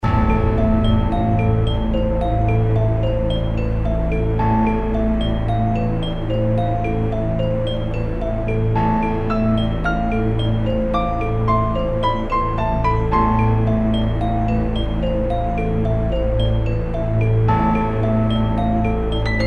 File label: mystery 110 BPM intro; アドベンチャーゲームやノベルゲーム; Adventure Game Music Pack